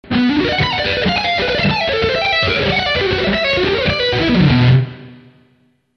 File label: Lick